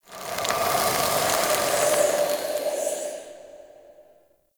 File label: Infested Pistol Random, Weapons